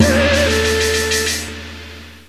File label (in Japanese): Trinityの胡弓とSC-88のEthinic Kit。; Chinese1